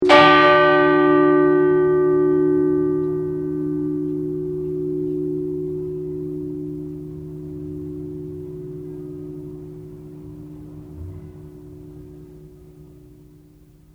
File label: clock chime; cinderella